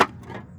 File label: Monster Can, percussion; Houston; Moody Park